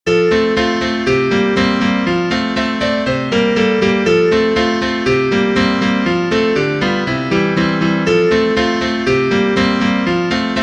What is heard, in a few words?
Bells, piano; Westminster